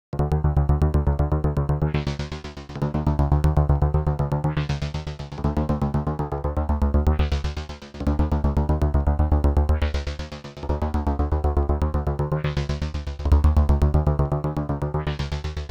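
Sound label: bass sedna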